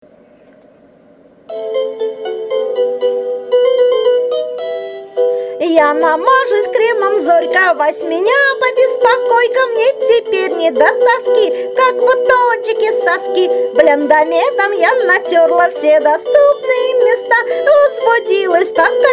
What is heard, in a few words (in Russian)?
reklamnye chastushki